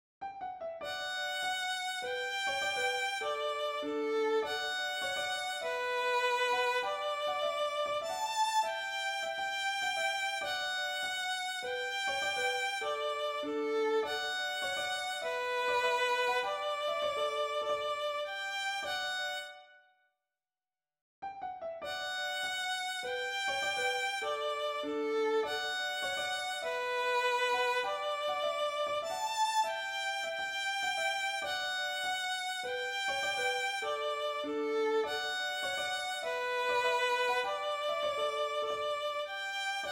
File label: Melody Harmony; The MacLellan Trio